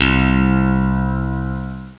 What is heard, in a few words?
Picked Bass C2; Star Trek JR Audio